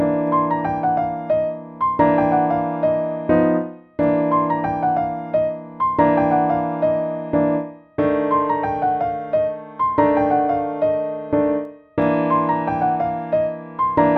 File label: LH Jazz Chords Exercise